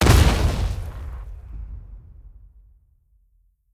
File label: ground impact; smite; god arthur